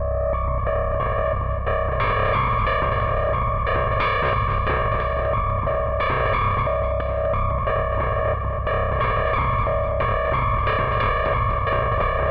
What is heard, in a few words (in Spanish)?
Loops mutantes